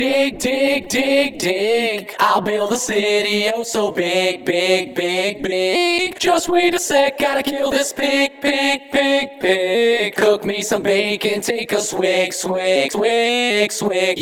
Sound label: Vocals